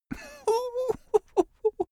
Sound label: male giggle hu